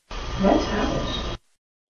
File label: Mobile Camcorder Recorder Nice house; Carlyle